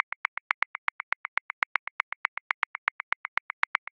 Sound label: Perc Agogo Accent 16ths; Grooves; Percussion; Ableton Live 12 Lite; Core Library